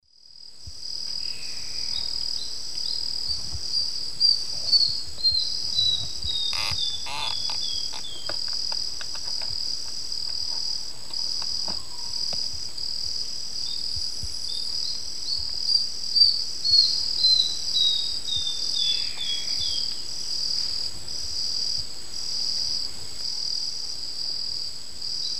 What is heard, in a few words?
Neopipo cinnamomea, song; S. America; Eastern Lowlands